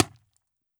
impact squeeky; Cartoon - film effects

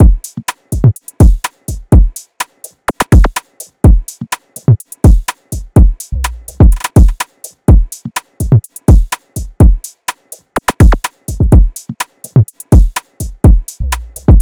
Drum Loop 125 BPM; Antidote Drum Loop; Cymatics

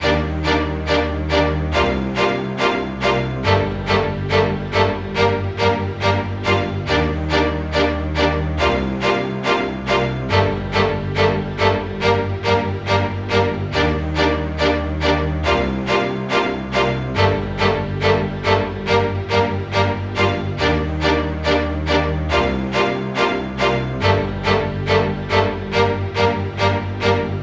String Loop 140 BPM G Min; String Loops; Future Bass; Instruments; Cymatics; Future Bass Starter Pack

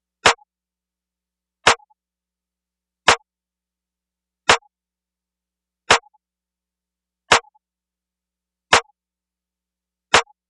Diffuse Drum Loop 85 BPM Snare, Lofi; Cymatics; ANALOG EVOLUTION Beta

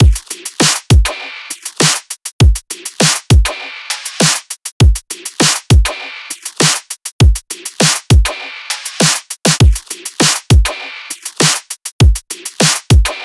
Drum Loop - 100 BPM; Midtempo; Carnage; Cymatics; ANALOG EVOLUTION Beta

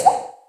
Droplet; FX; Cymatics; Light Free Sample Pack; Bonus Samples

